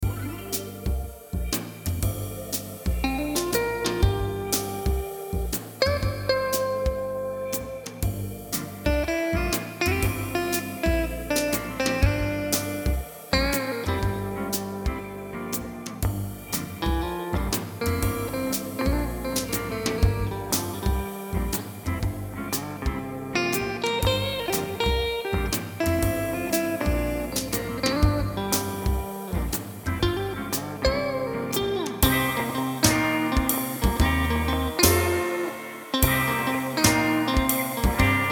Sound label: swing lounge